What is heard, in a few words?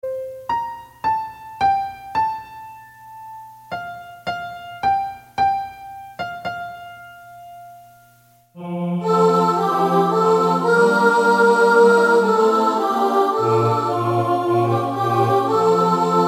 SOPRANO